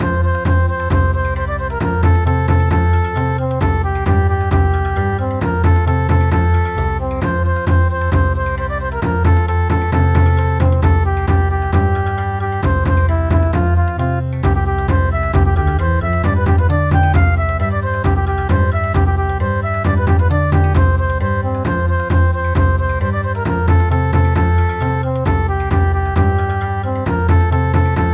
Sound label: Polka Bon Odori; Helena Polka